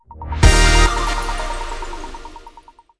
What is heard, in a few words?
medium Win; jewel fruits